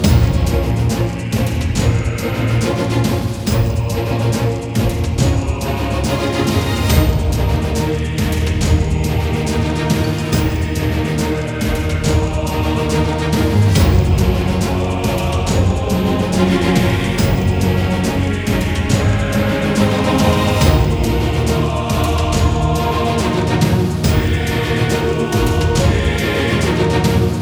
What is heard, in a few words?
Menu Action LOOP